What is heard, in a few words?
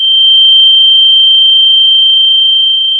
Sound of a tea kettle whistling